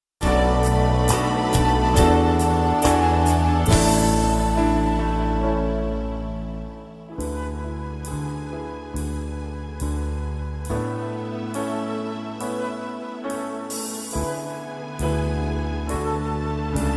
BACKING TRACK

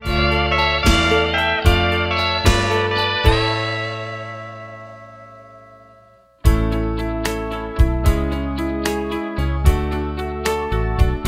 ACCOMP

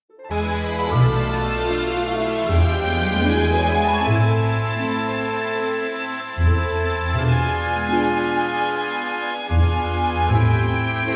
ACCOMP

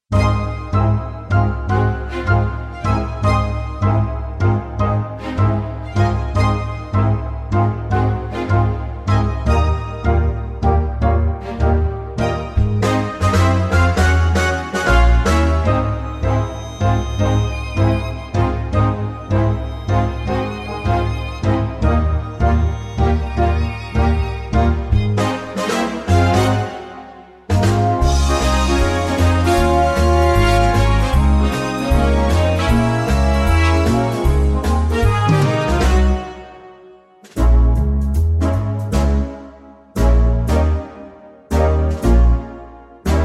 ACCOMP Ab

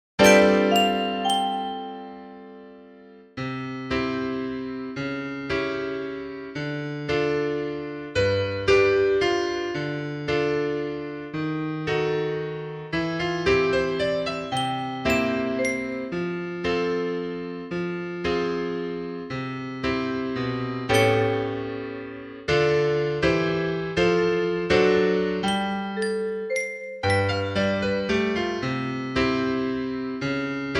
ACCOMP

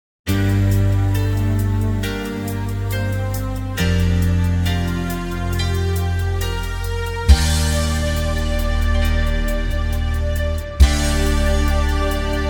ACCOMP

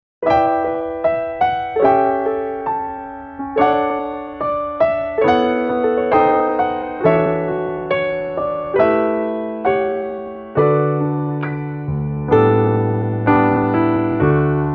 ACCOMP D FASTER

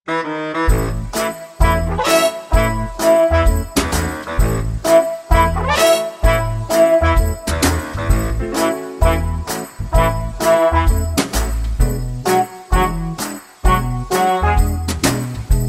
ACCOMP in A short

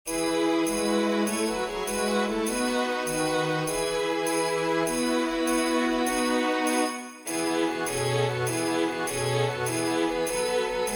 Here We Come A Caroling ACCOMP

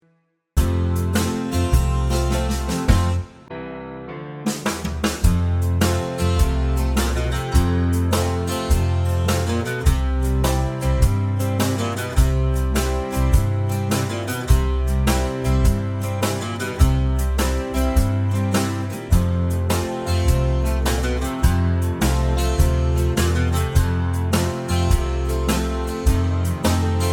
ACCOMP short

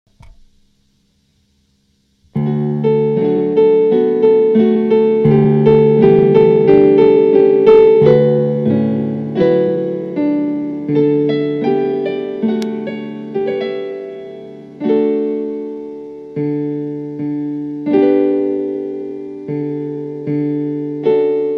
Karaoke Key of d

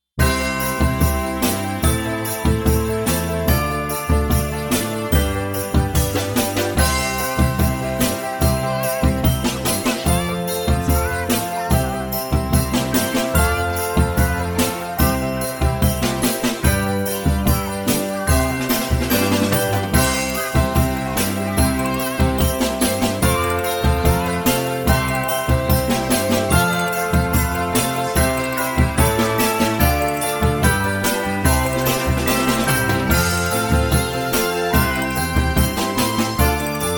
ACCOMP

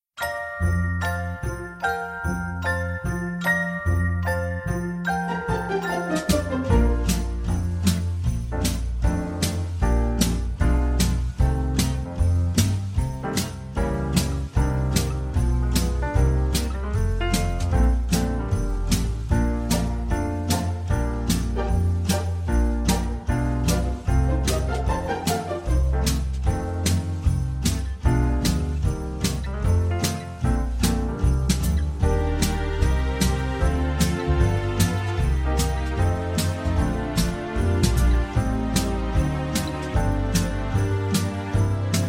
ACCOMP in Bb

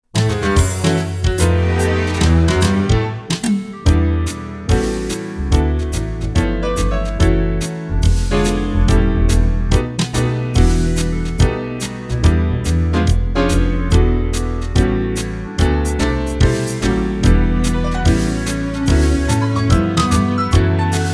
ACCOMP in D

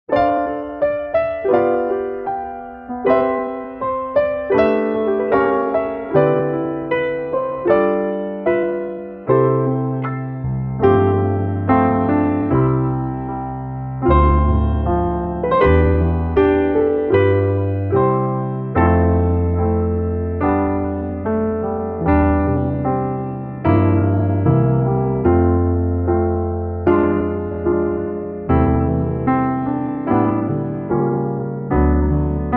ACCOMP C

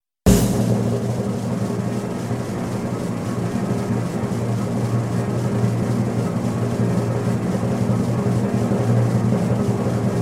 ACCOMP full song

